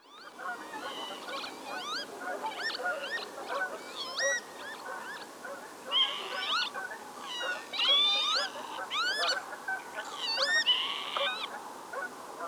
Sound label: Lapwing, birdsong